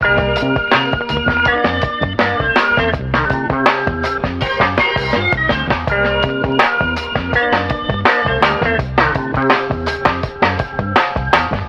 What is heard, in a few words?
full, Loops Phonky